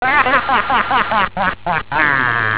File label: haha